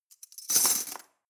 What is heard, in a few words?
cutlery teaspoons drawer place